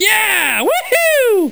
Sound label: correct